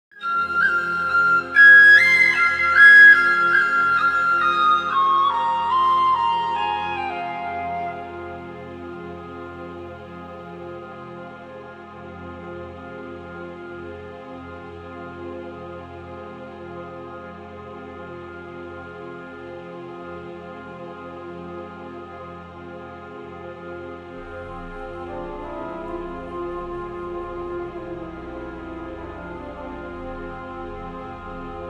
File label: persian fantasy